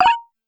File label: message; Dargalon